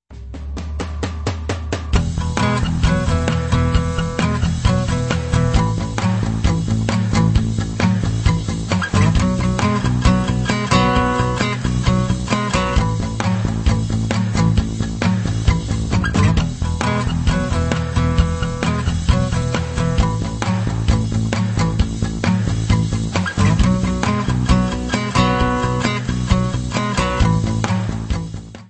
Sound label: jam blues